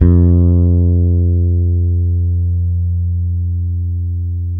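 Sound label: fretless bass; Spectrasonics; Bass Legends; 6-STR FRETLS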